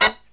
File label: HIGH HIT